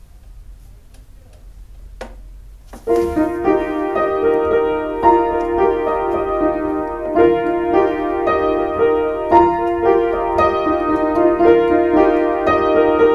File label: the piano